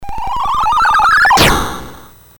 BASIC sid MOS6581R4, GAMES; Sword of Fargoal

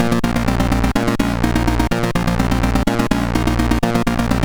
Race, sid, MOS6581R3

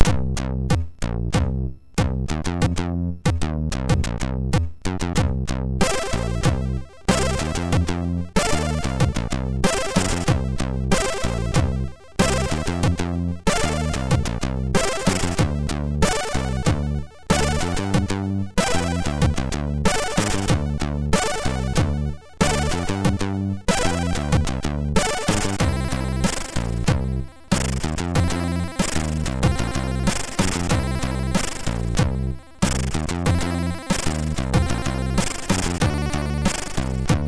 Slow Beat, MOS6581R2; X-Radical

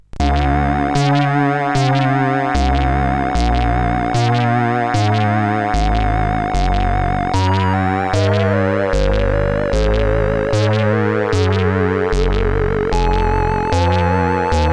Zipper 3 note, CSG8580R5

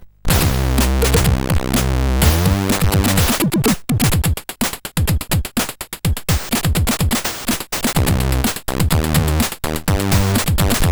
Music, CSG8580R5

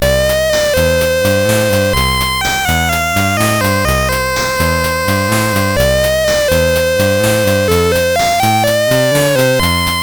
CSG8580R5, GAMES; Street Beat

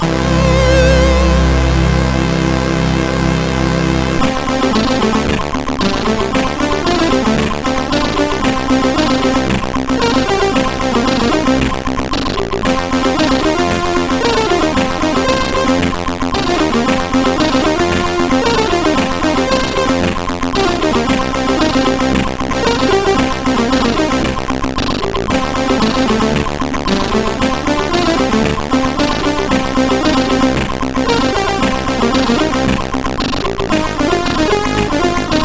E-Load loader, CSG8580R5; hvsc

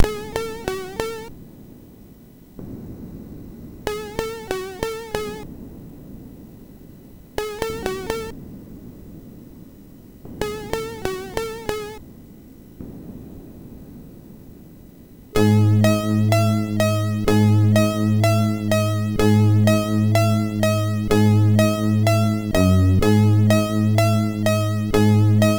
intro, sid MOS6581R4; hvsc

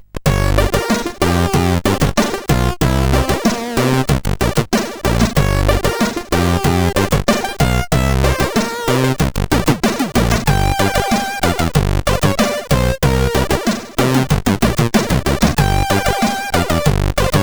Short Intro, CSG8580R5

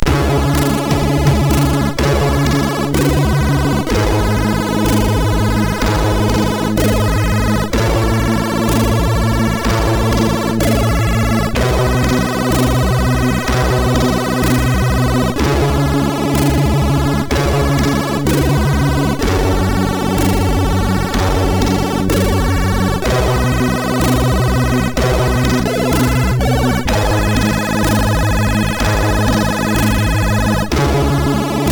Hawkeye loader, MOS6581R4

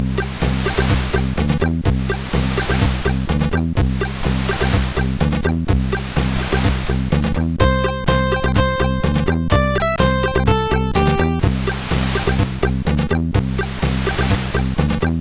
Beach, MOS6581R4

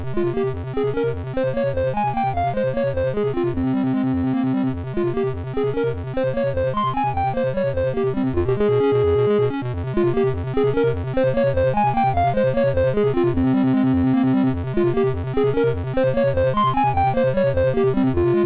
Etude Op10 No9 F Minor, MOS6581R2

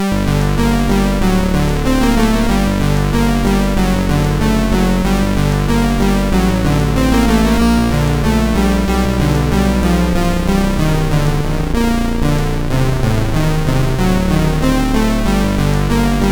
Classical, MOS6581R3

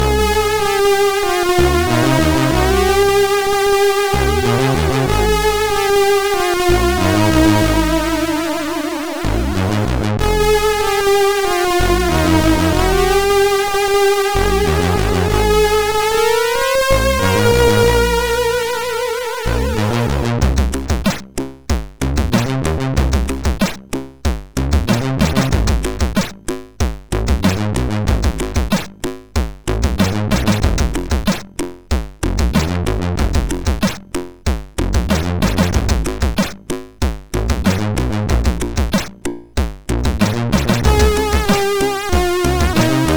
Get Sad, sid MOS6581R4

